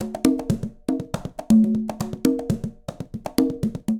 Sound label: Conga, Latin Percussion; Instrument Kits; Ableton; Factory Packs